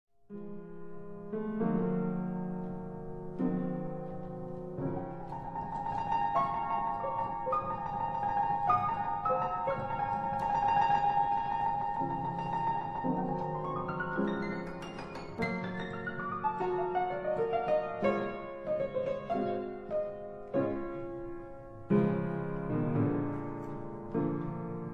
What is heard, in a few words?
Etudes for piano, B flat minor